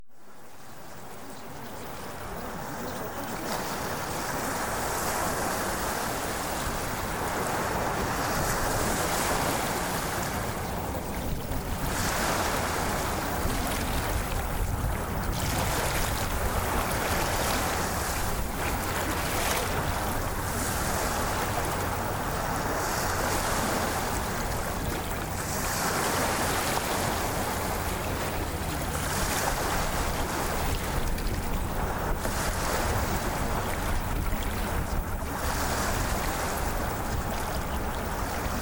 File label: Ocean Waves; MALE BEAUTY 2.0